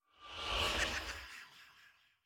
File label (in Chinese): 恼鬼待机声